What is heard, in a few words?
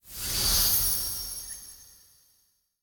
Ability Gooberball Fire; Lurker; Evolve; Gorgon